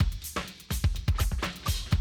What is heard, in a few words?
Classic DrumLoops, 125